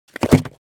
Ice hockey puck hit board

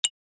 Futuristic click

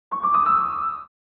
Old vintage cartoon sneaky steps

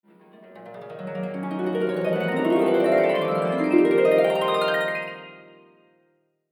Dream harp

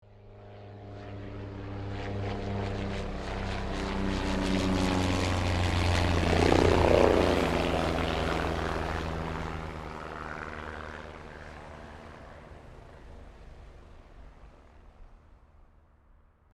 Light aircraft fast overhead passby

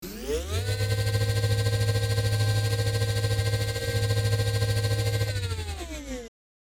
Electric scooter close perspective

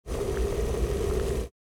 Electric kettle boiling water; appliances

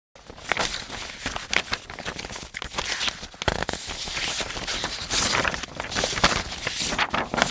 Paper shuffling and organizing office supplies

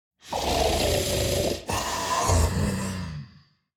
Demon king lord snore sleeping

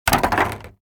Trash can falling to concrete hit impact

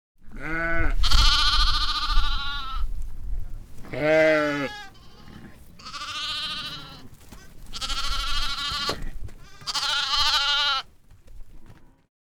Lambs and sheep in the stable field ambience

